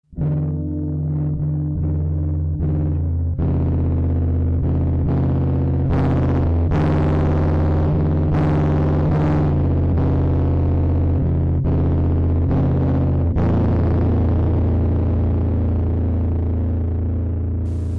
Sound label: Crawling; darkages